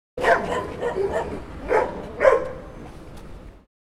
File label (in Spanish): Ladrido, Plaza de Pichidegua; mapa sonoro